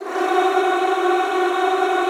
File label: choir, F3; Best Service; Extended Classical Choir